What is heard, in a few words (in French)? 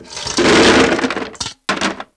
glacon, BAR AND PUB; East West; Global Sounds